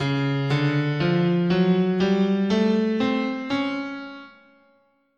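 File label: D flat Persian, guitar, scale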